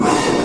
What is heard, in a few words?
subway sound; Subway Sound Construction Kit